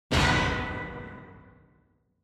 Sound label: Quiz Question Low; クイズ; 出題